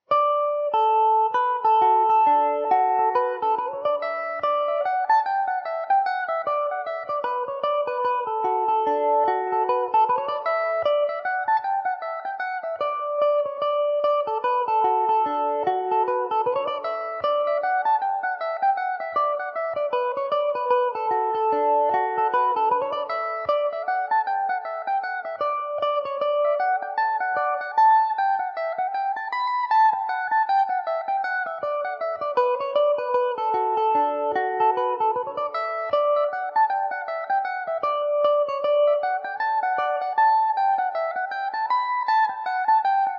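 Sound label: D major mandobird, irish mandolin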